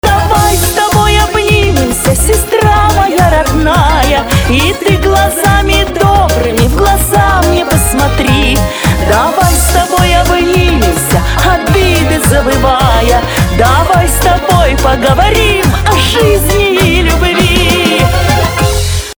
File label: duet